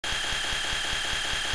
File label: laser minigun charge